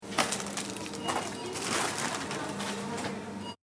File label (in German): Supermarkt Verpackung